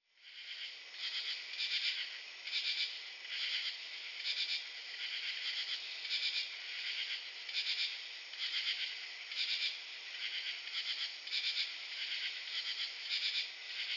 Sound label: AMBIANCE FOREST NIGHT